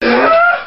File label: huh, Voice